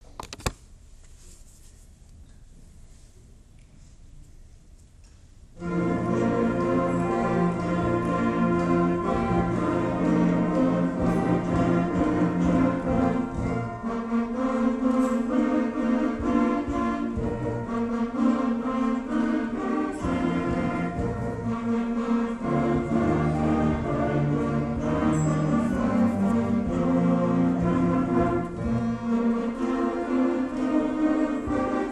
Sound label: fanfare, band